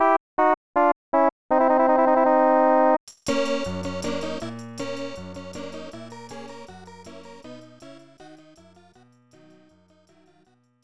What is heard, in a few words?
Casino Lose; Estpolis Denki 2; Lufia 2 Rise of the Sinistrals; snes